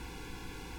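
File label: ORGAN Clarinet noise, Farfisa; VIP Clr4; Farfisa Clar Noiz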